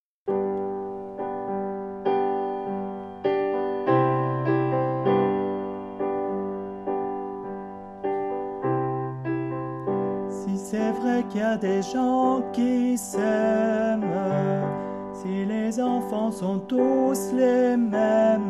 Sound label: alto